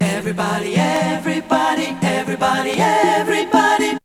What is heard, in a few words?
VOCS